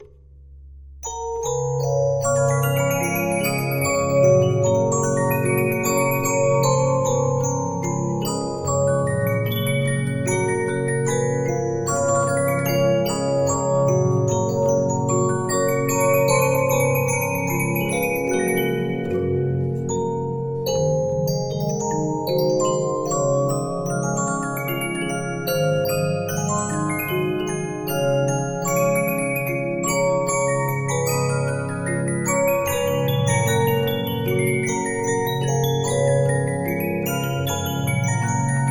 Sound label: Regina, 15inch disc musicbox